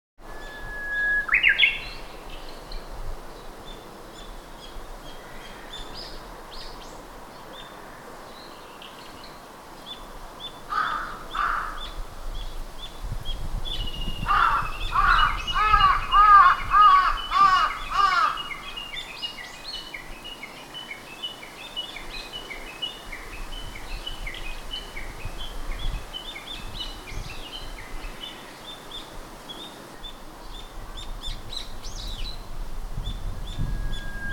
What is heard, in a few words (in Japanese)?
uguisu